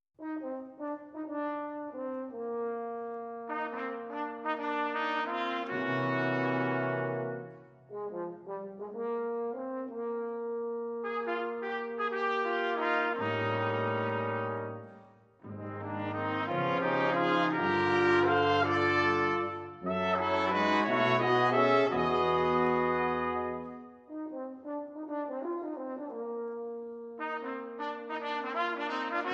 brass

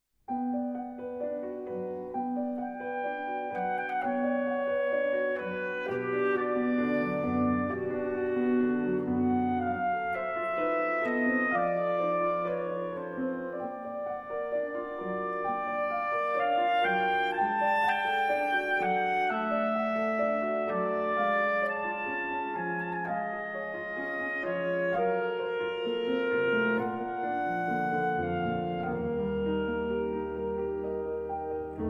Trio

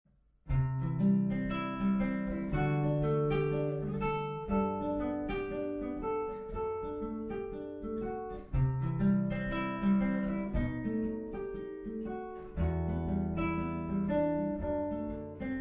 guitar

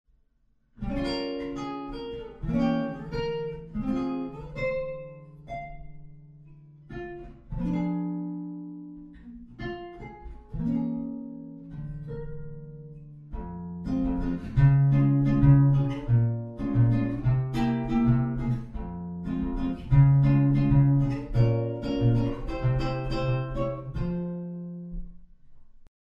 bossa left hand

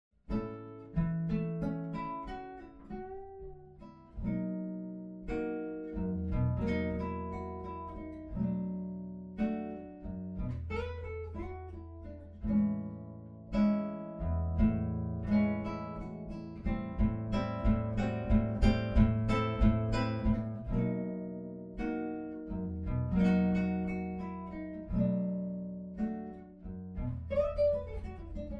blues

